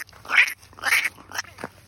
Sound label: pew pew sound